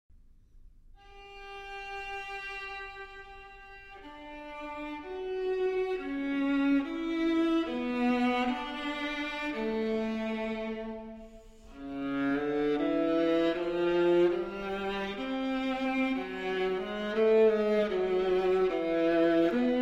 Sonata For Viola and Piano, III. Adagio

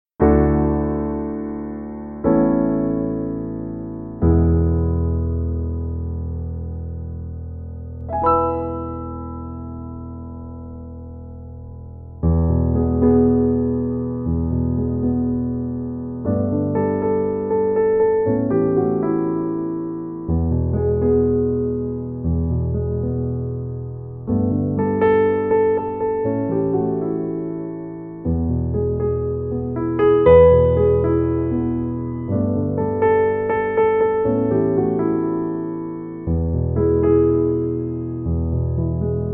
Instrumental Piano

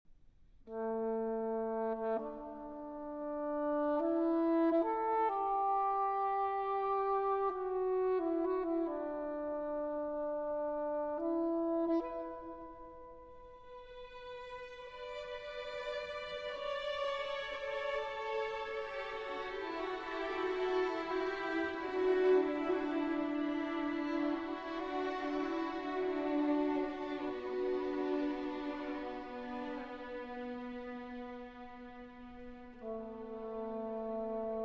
Saxophone Concerto, Gaelic Psalm, Live

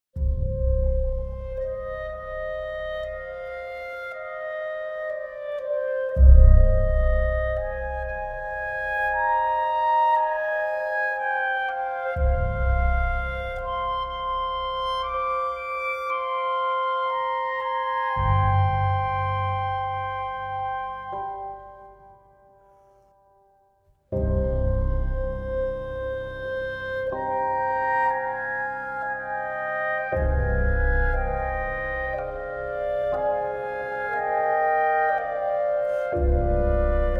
Clarinets, Piano, Pizzicato Strings; Lamentoso

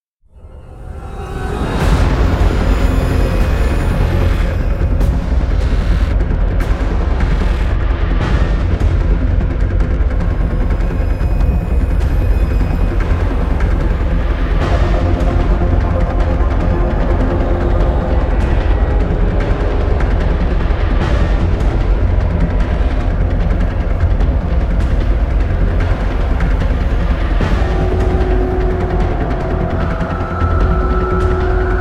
Tick Tock

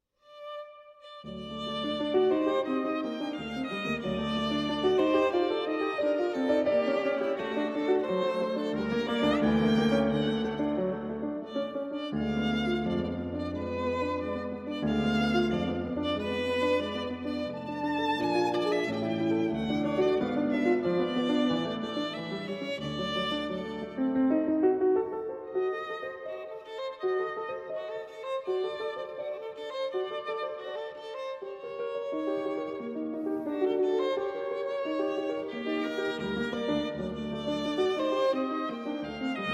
Violin Sonata, G Major; Brahms; Violin Sonata No. 1; Op. 78

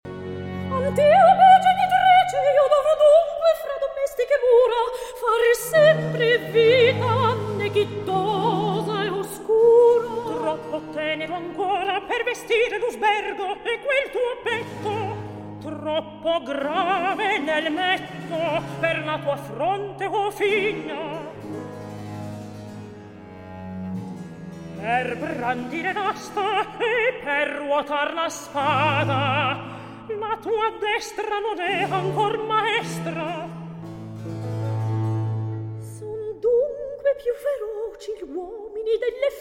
Recitativo; Martesia, Antiope

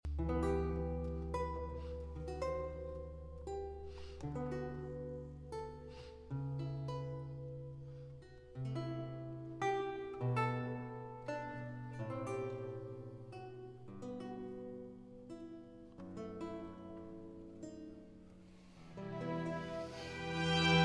2 Violins, Strings & Continuo; II. Largo

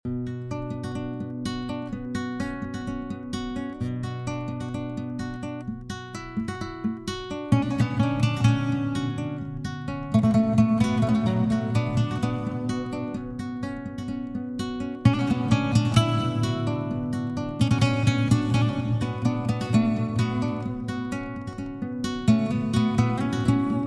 Improvisation

